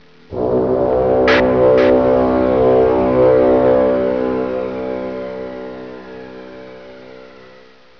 backstage

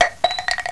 chute, weapons

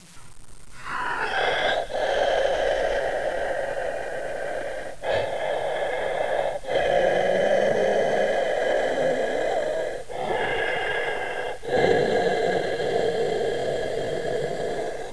slower breathe

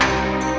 antivir shoot

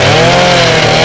chainsaw full, weapons

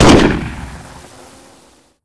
flare launch

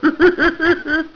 grunt idle; hgrunt2

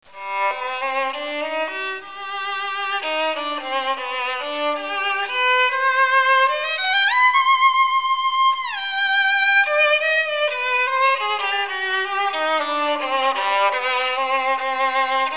violin

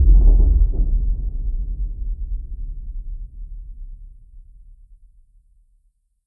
distant blastdoor slam

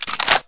clip in, weapons; paranoia

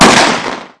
K98 shot

